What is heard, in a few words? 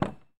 Prowler Door Open, Automobiles